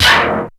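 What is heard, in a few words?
METAL, FX percussion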